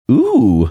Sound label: "ooooh"